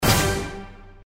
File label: farm scatter; games; Farm Mania; Happy Farm